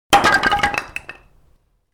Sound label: Wood drop Small, Wood: Pieces Drop Onto Concrete, Light; Materials